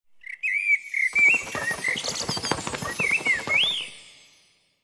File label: Tap; Yu-Gi-Oh! Master Duel